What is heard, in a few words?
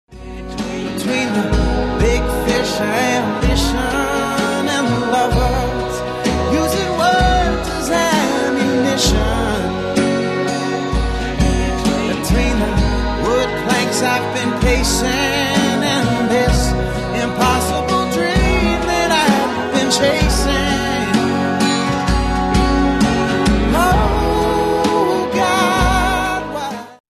violin